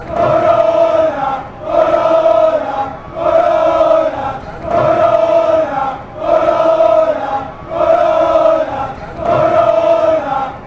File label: corona, chants